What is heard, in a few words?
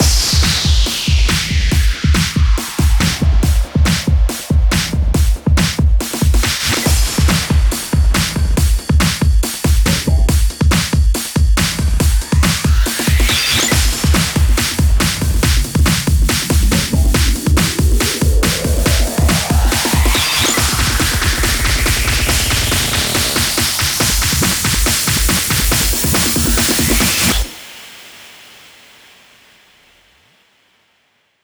140BPM 16Bars, HandsUp & Trance Fills; Punkz; Vengeance Samples